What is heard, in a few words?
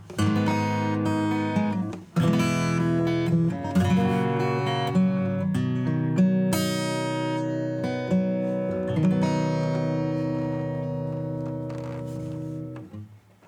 acoustic Clean Guitar; Xtressor